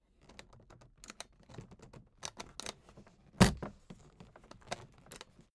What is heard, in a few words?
suitcase handling